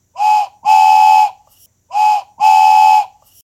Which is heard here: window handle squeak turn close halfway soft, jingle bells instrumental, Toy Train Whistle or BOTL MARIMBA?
Toy Train Whistle